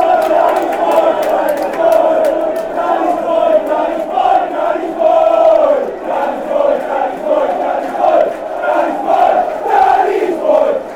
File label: danny boy, fanchants